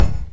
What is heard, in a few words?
hit impact